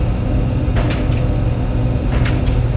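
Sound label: pump factory loop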